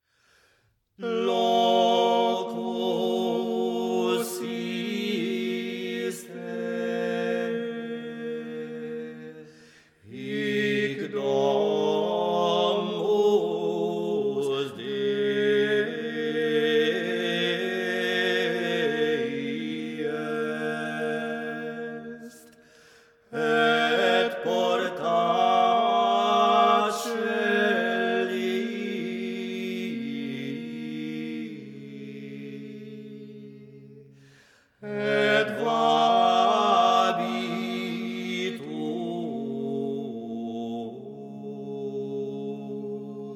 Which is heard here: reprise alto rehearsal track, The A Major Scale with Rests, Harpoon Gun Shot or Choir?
Choir